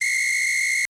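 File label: Whistle; Yamaha DTXpress-II; Perc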